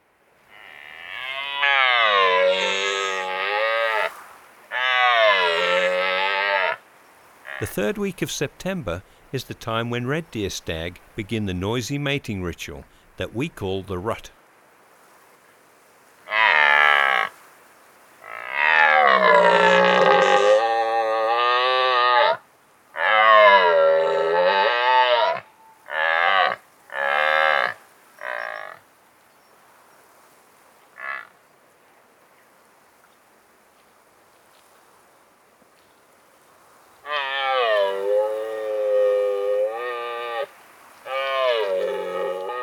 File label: Red Deer Stag